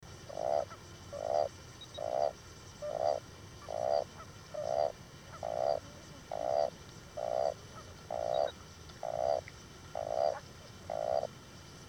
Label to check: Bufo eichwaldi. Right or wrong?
Right